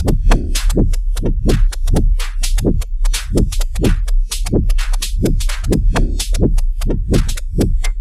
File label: volca